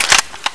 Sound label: aug clip out, weapons; czero opforce